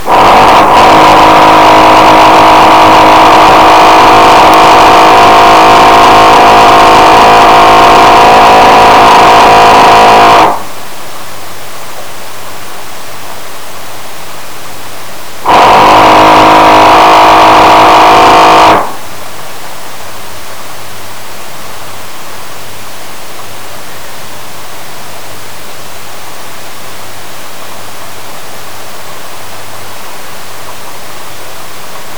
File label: grinding